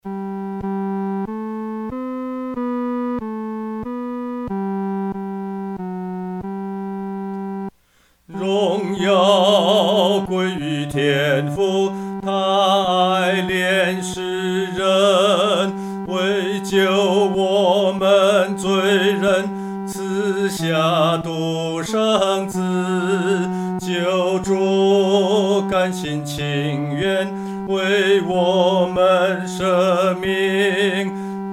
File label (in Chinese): 独唱 第三声; 荣耀归于真神